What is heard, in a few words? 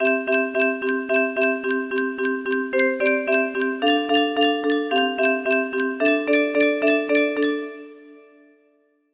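music box melody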